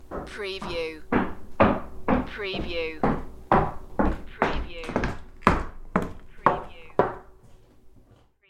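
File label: High Heels Wood Floor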